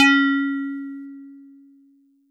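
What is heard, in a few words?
Metal Tube DX100 C#1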